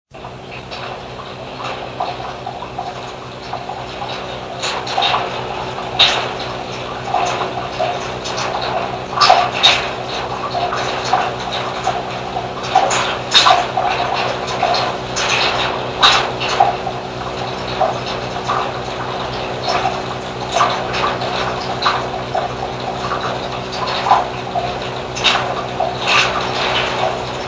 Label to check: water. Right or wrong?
Right